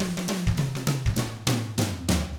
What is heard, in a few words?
FUNKY, Drums